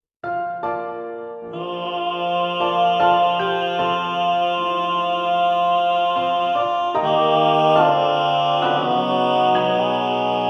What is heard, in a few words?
satb balanced voices